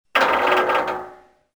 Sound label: metal stress, ambience; Soldier Of Fortune II Double Helix; XBOX